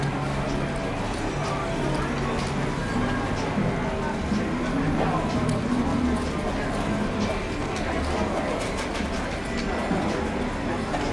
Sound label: magenta, Ambience; sf2e; murder in metal city